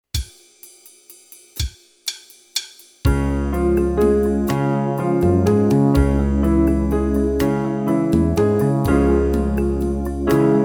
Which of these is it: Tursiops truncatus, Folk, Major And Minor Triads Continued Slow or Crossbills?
Major And Minor Triads Continued Slow